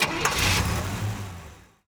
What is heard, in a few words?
vehicle classic ignition; teardown; ps5 windows xbox series xs